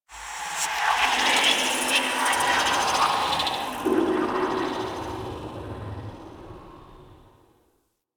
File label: Derelict Corrupted Artifact Random; Ambience; Props; Warframe; Orokin Derelict